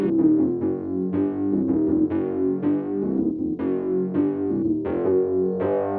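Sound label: synth bass, drum and bass, 160 bpm, C; eJay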